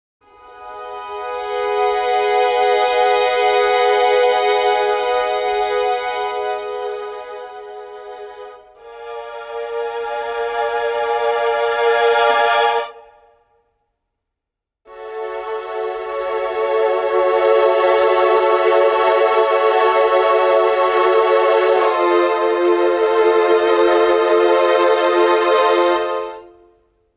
Con Sordino Strings 3 Articulations Dynamics Expression dry; Orchestral Tools Berlin